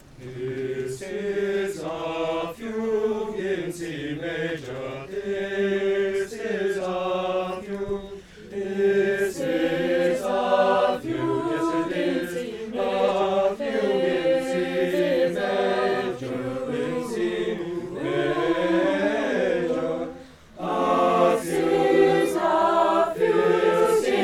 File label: fugue